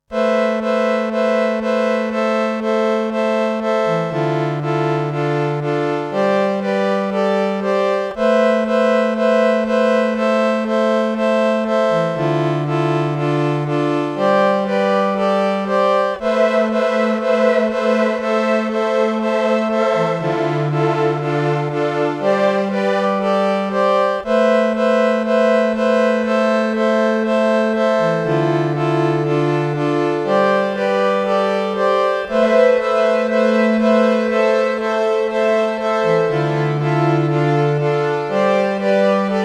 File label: Wersi Strings Chorus